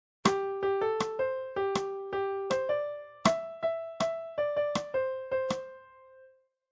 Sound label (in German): Balkensatz